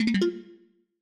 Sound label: stream user joined; backspace